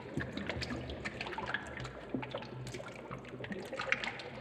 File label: water puddle verb